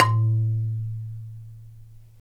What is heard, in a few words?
Marimba; Roland LCDP11; Africa VOL-1; Af.Marimbas